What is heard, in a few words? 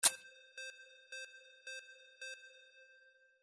ROLLOVER REV; windy beach audio